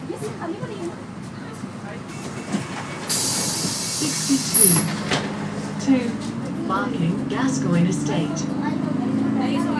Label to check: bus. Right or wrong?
Right